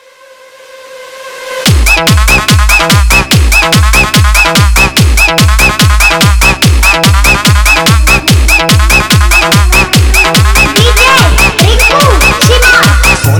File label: Remix Haryanvi Song